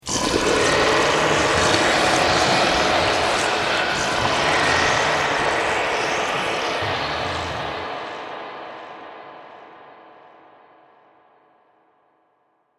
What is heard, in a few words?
A Cave Full Of Zombies; Zomby Sound Effects; horror; Andrew Fleming